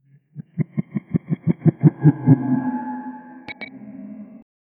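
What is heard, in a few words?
a faint ghostly laugh drifting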